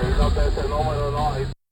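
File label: CB Radio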